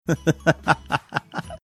cute laugh

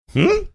hmm

